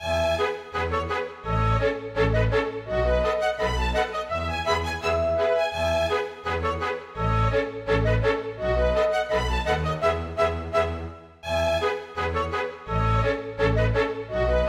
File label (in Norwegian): Polka; storgårdsmusikk